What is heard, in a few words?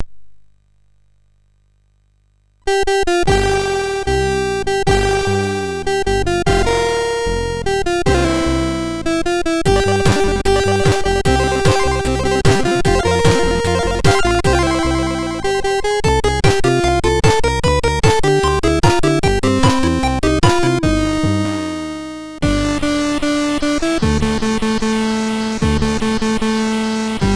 MOS6581; sid MOS6581R4